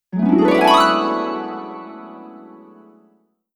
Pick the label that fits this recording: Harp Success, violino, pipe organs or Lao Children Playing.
Harp Success